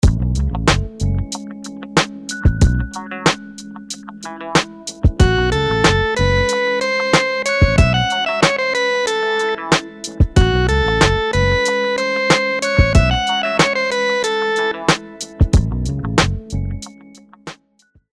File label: rhythms dotted 8s